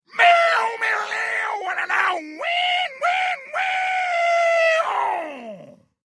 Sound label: first solo